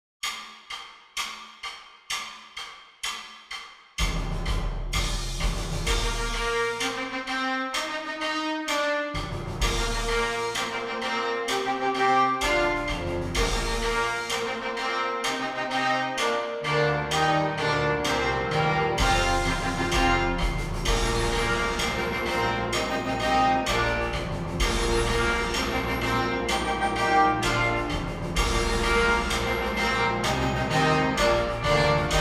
Fanfare Flex Band Full Ensemble w Click Track 8 beat intro